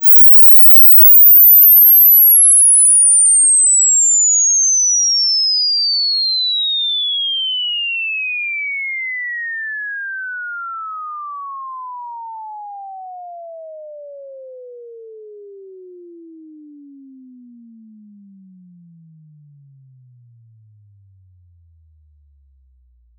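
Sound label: Inverse sweep 20Hz 20480Hz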